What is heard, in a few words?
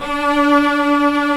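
cello A; AKAI S-Series CD-ROM Sound Library VOL-2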